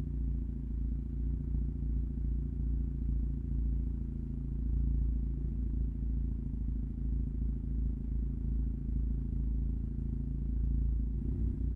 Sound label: Car Idle; Game rip; MiSide